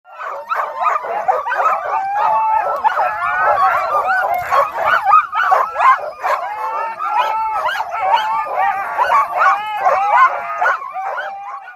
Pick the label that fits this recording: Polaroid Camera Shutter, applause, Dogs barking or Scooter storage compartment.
Dogs barking